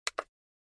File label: Camera Compact (Button)